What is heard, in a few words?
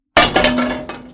metal